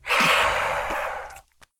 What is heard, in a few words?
Ghoul Growl; SyrinScape; East Ward